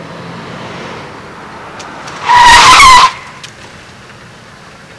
skid to; Transportation

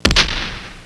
CANNON, Military

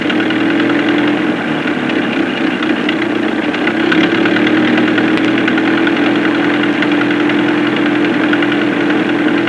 idling; Transportation

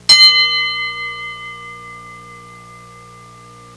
FIGHT BE, Bells

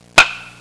BATTED B, Sports

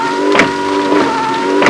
WINDS; Transportation

